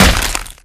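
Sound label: spectre table slam impact body crunch; Titanfall 2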